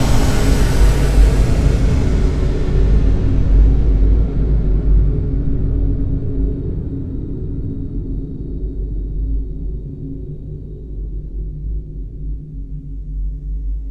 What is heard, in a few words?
boom long; vine